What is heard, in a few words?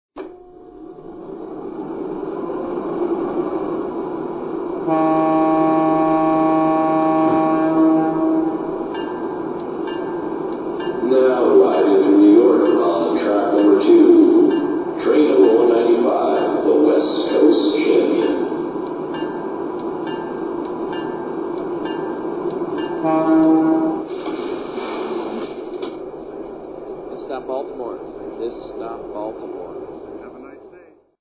GG1